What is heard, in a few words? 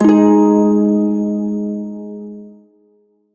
Shawzin Chromatic Chord; Warframe; Prime Shawzin